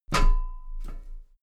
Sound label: step metal ladder step top regular